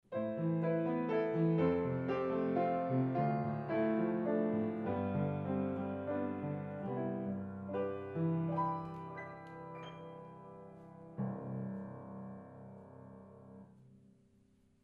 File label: Sonic Port VX Piano Stereo Gain05 1m